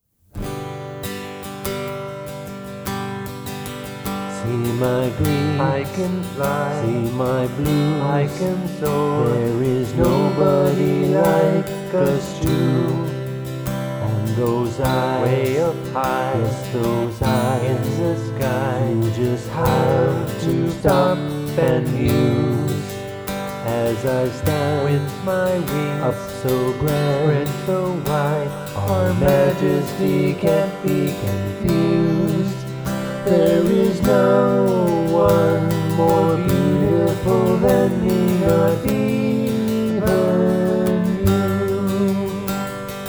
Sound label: duet